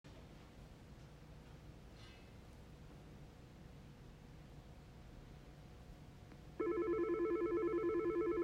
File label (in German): Telefonklingeln